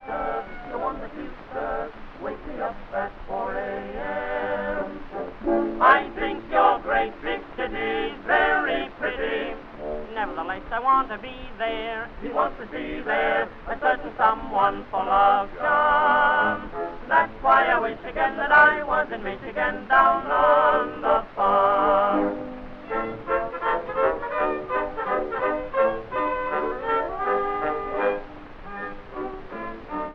tenor and chorus orchestra accompaniment; NY Hippodrome

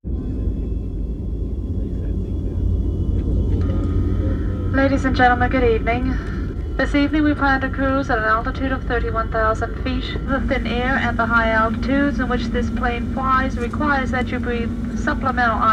Airplane Jet trip

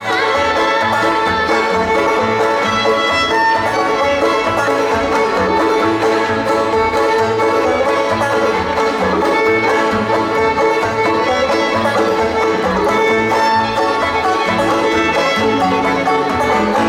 Cotton Eyed Joe, musicbox

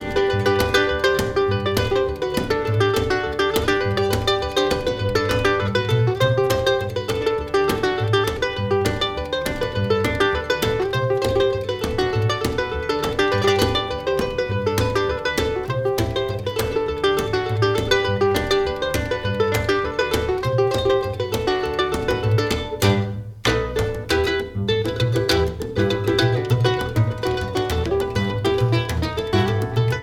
Brazilian Tune, musicbox

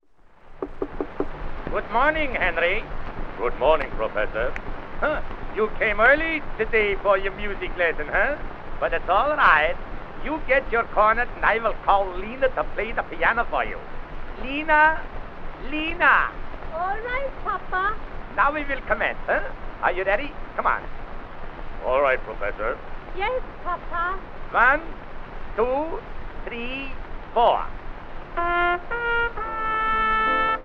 Laughing record

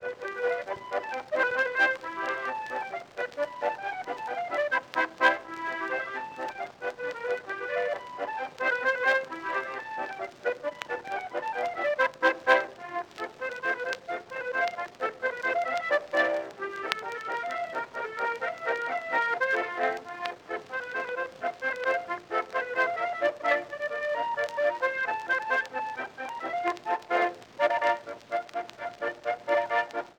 Polka Warszawska wiszniaczka Warsaw polka; loc-edison

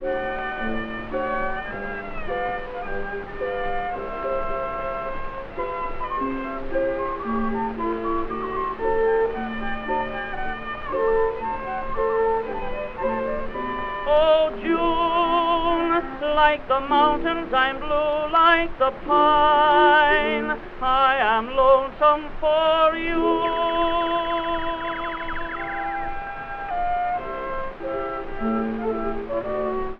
tenor and chorus with orchestra

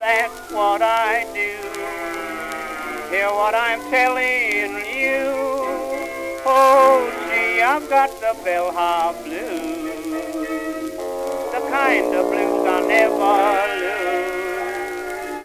Bell hop blues, jukebox

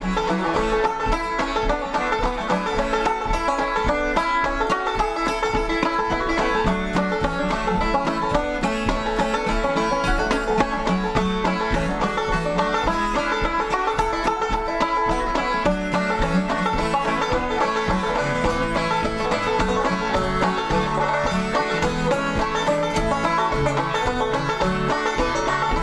musicbox

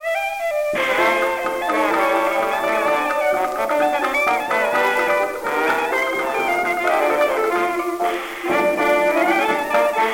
jukebox

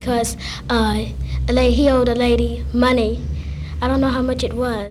Sounds from New York Subway; Lincoln

